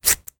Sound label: cutting hair scissors single cut; foley; zapsplat